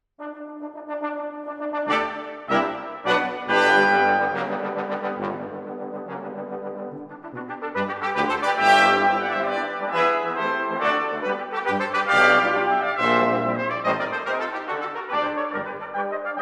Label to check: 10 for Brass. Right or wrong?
Right